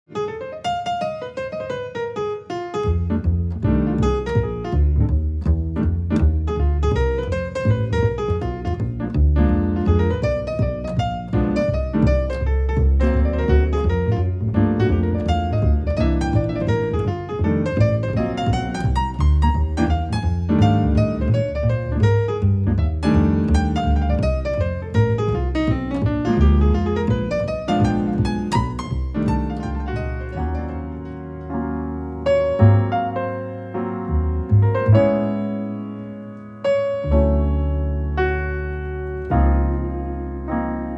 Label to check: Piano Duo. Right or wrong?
Right